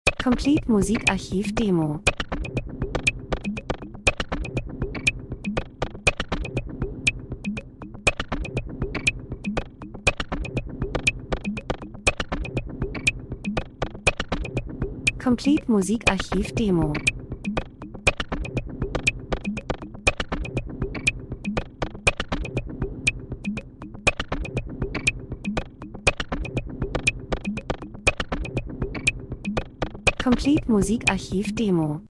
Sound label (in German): drums, rhythmus; Elektro Rhythmus Verfremdet gleichförmig Menschen Zeitgeschehen Ablauf Maschine Industrie Dokumentation